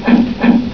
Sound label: flap; warlords; ST-XX Amiga Soundtracker Sample Packs